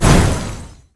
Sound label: Shield Deactivate